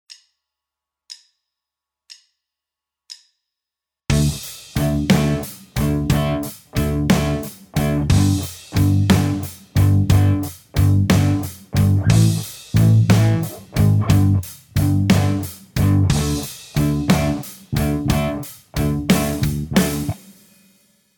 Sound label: Rockschool backing; Guitar Techniques 2019 Issue 296 June